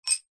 industrial wrench flat set down hard floor; zapsplat

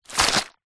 horror human organ drop squelch bloody guts

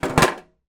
foley small metal case tin down on concrete; zapsplat

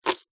poker chips stack knock over

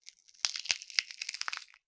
horror bone crush; sound effects one

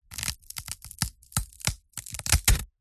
horror gore small bone twist snap crack crunch

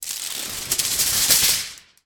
household tin foil sheet remove from roll; sound effects one

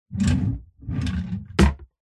household bedside cabinet drawer; zapsplat

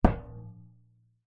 impacts metal small road sign knock hit; zapsplat

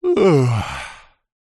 human male middle aged yawn restful happy; zapsplat

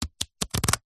leisure playing cards deck flick through

